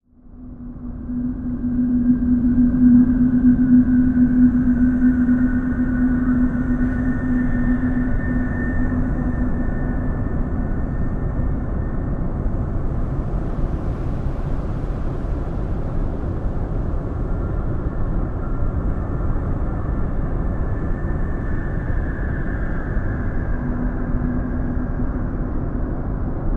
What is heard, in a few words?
Void Empty Atmos Hvy Rev, evolving